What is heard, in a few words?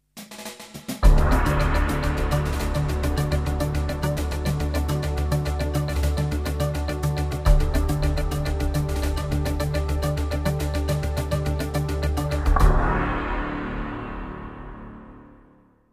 jingles; 90 DDD Jingles